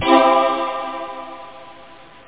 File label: human string